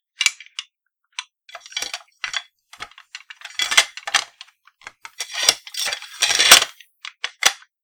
Inserting A Rocket Into An Rpg 7 Rocket Launcher Full Sequence